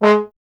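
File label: bass trombone G1; Miroslav Vitous; Solo Instruments VOL-1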